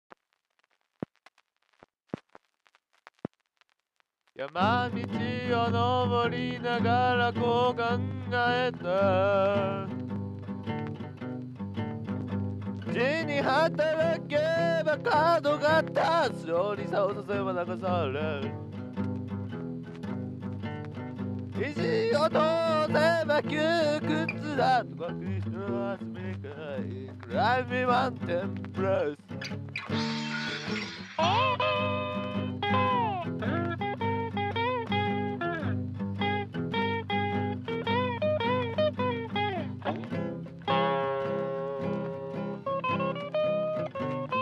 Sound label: blues